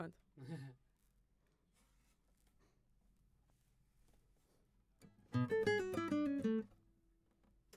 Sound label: GTR